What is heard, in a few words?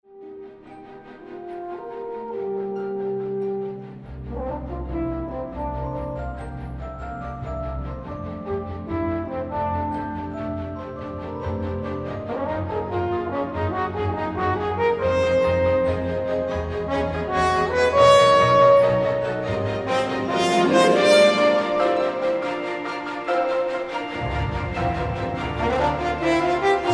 horn